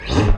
FAST UP, Electric Basses; PlatinumPhattCD2; E-MU Producer Series Vol. 8 – Platinum Phatt

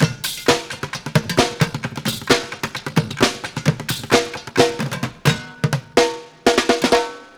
Street Groove, Ambient Grooves

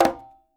Quinto, Percussion

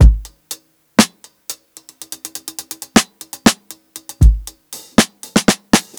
120 HALF TIME ROLL, DRUMS LOOPS; BASEMENT DRUMS VOL 2

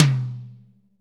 MH TOM, STUDIO TOM

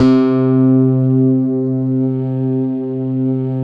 SYN JD800; Sound & Vision - Gigapack I CD 2; JD 800/990

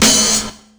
SP1200 snare; Hip Hop; E-MU Formula 4000 Series Vol. 1; Hip Hop Nation

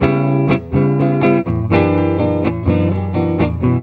EGUIT BREAKS; Best Service; World Colours

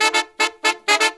Pop & Funk Brass; Best Service ProSamples vol.25; AKAI

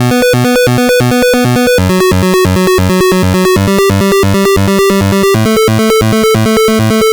Game Boy Bass; 8-Bit; Chiptune; Nintendo

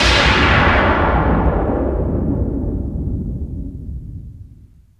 BANGS; Megabass Remix! 2

